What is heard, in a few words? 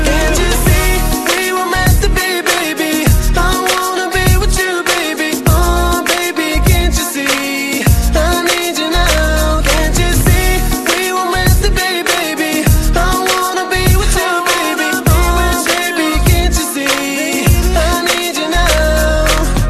Ringtone Mobile